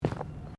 Footsteps Boots Plastic Ground Mat Step; Footstep Foley; Footsteps (Sound Effects:Human)

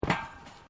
Footsteps Boots Grill Metal Step; Footstep Foley; Human

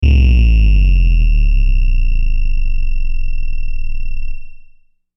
Electrical High Buzz Power Down; Production Element Imaging Element Accent Transition

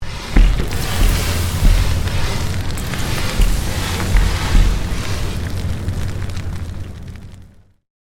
Created Magic Spell Meteor Shower; Series of created spell sounds

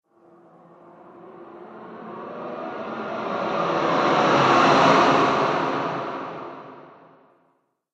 Rising Space Transition, Transition Sound Effects

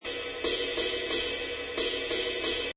Jazz ride cymbal loop at 180 bpm.; Drum Loops

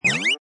Cartoon Slip; Comedy/Cartoon, Slip Crash 01: Bonk, fall down and crash; Funny Sounds; Lynne Music

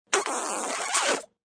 Funny Kiss, Weird funny kissing sound; Human

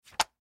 Magazine Set Down; Set Downs (Sound Effects:Objects); Foley; Blastwave

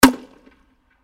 Grenade Launcher 40mm M79 Single Shot; Close Perspective; Grenade Sound Effects; Blastwave; Warfare

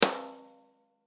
Drum Hit Snare, Drum Hit Snare Rim Shot; Drum Kit; Blastwave

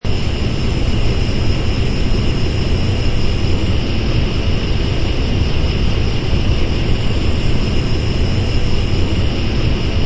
Burning gas of stovetop.; Kitchen; Household

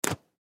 Rubber Band Shoot, Rubber Band Shoot Fling; Miscellaneous Office; Foley; Blastwave

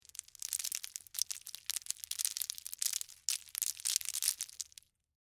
Debris Crackers, Oyster; Texture; BlastWave

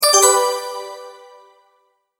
multimedia tone, Goodbye; Tones; Sound Design; Multimedia Tone-Goodbye 33; McKinney Sound

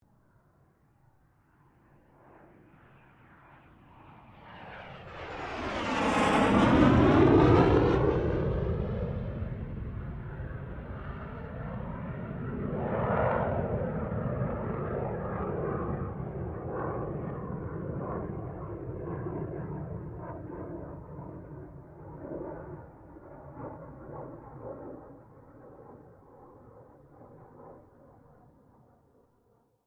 AIRCRAFT CA-27 SABRE PASS STEREO; CA-27/F-86 Sabre; Airplanes; Vehicles; Aircraft Collection

